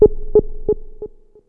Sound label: DRY ECHO, ANALOG ECHO; Zero-G Ambient 1